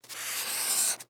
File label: Marker